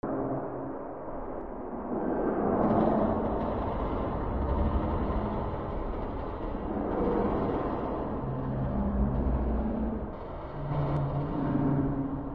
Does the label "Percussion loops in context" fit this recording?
No